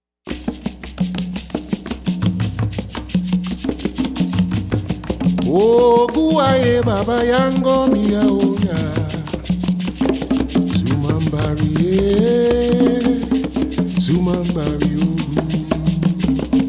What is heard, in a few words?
Caribbean Jazz